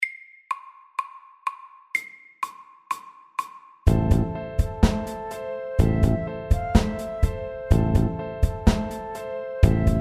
A minor rap

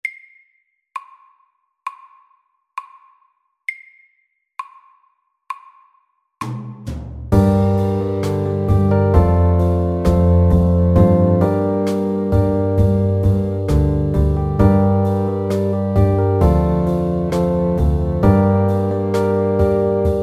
Pop ballad MEDIUM

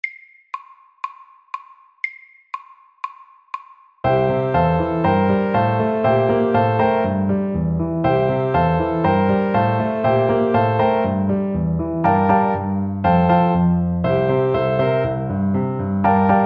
Honky tonk SLOW

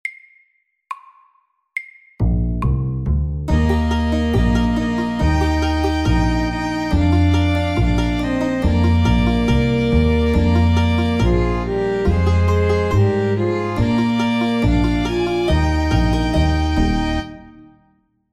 Country and western SLOW 70

